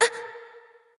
nv breath; smite; awilix

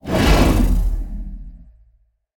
throw; smite; ahpuch skin10

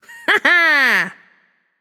hehheh; smite; pele

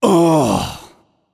nv ugh; cernunnos

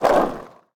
skateboard roll push; smite; god sunwukong

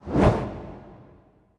trident swing slow; smite; poseidon

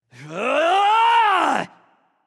battle cry; smite; loki

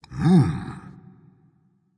thinking, voice; Smite; ymir skin6

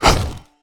swing; smite; cerberus

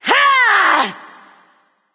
battlecry; Smite; bellona

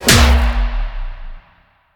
Land; smite; raijin

